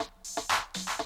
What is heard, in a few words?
120, Classic Drum Loops